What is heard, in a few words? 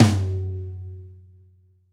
Pop Tom, Roland BK-5